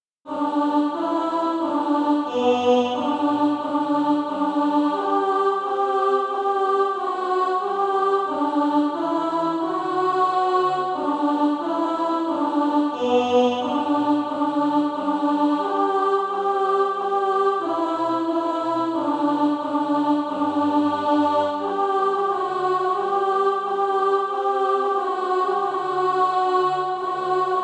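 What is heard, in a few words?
alto, SATB Practise Music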